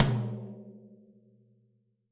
Tom 90s Heavy Rock; Drums

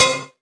Stick Vinyl; Drums; Misc Percussion

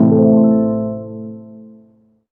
Synth Prism Amin

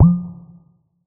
FX Blop Techno; FX Hit; Drums; Ableton Live 12 Lite; Core Library

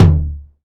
Tom Real, Drums

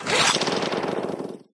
start up; There; ThereClient; bacio

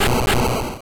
virus explode; MMBN MMO; Attacks

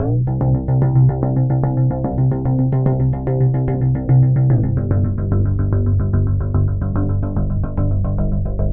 funk; ACID Pro 6.0; FUNK FACTORY

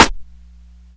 Snare, drums; Ableton Live 12 Lite Core Library

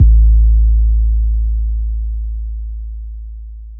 Kick 808 Long

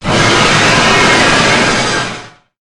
ROAR; PHANTASY STAR ONLINE Blue Burst

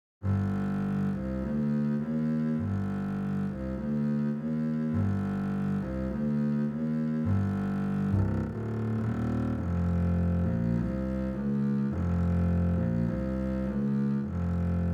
Double Bass; Sony; ACID Pro 7.0

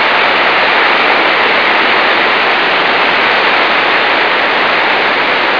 big falls; Digital Paintball 2

